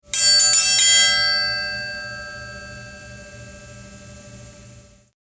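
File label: Triangle, Chimes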